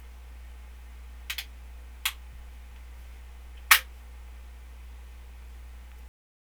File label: Rifle Lock; forlorn